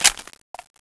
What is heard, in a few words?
mp5 clip out; weapons